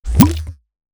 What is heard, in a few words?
Checkpoint Exit